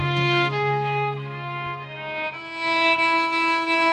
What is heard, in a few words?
sad violin